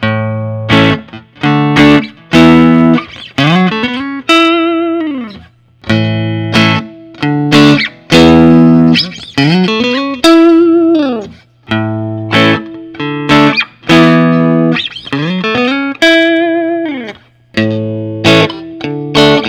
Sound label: suhr classic s; huge nec; ocean